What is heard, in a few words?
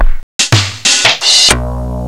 MIRAGE; Rubber Chicken Software Sample Bank; OLD SYNTHS; OTHER OLD